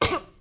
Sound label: piglette collision